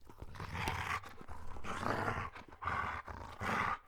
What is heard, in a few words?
angry breath dog; Apotheorasis